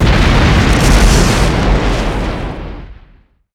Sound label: motion bomb; Original TK Sounds